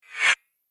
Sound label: Single Swipe, whoosh